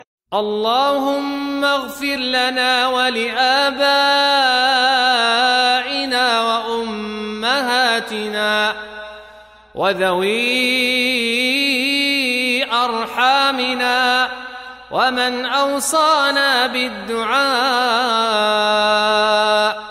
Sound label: Beautiful Dua Islamic Ringtone; ringtones